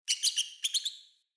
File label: Messenger Rat Squeak A; Ambient; Vocals; Verminia; Animals